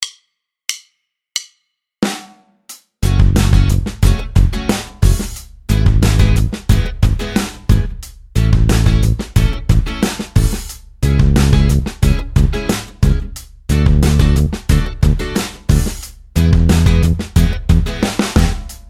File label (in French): notes piquees exercice, basse; exercices